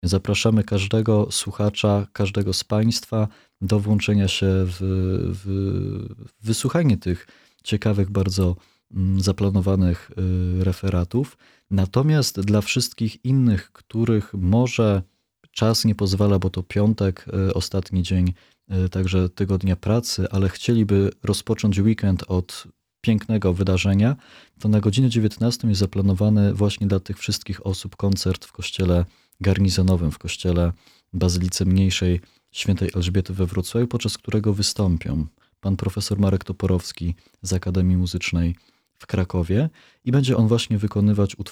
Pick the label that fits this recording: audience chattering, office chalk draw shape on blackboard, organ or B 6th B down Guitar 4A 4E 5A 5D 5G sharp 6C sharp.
organ